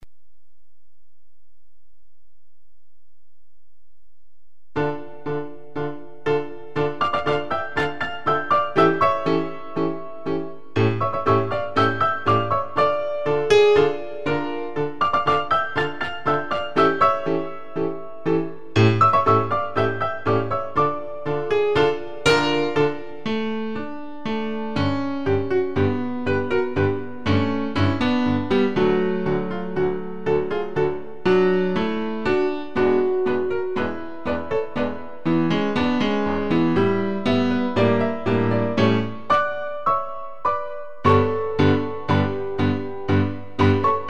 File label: Tango Argentino; Seiber; Rumba Bis Walzer